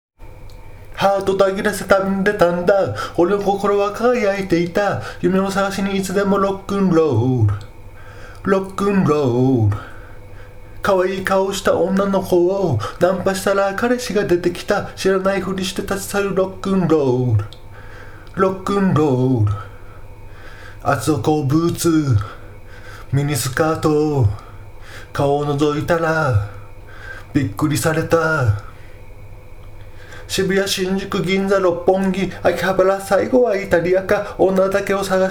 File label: rock n roll